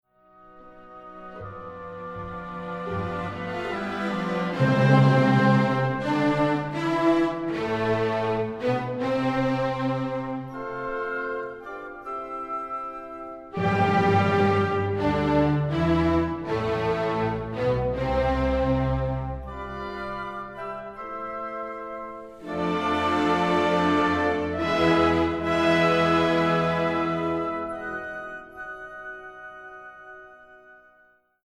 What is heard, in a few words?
filarmonica Andante